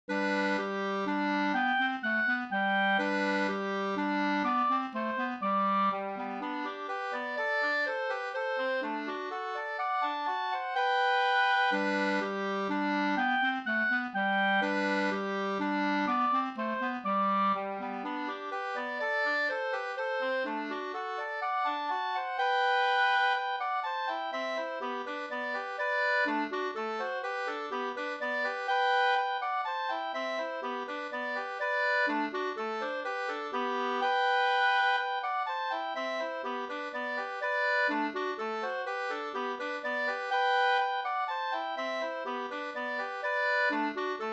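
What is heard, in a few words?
polska, two-part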